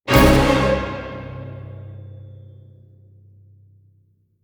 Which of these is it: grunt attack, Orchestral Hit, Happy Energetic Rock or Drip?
Orchestral Hit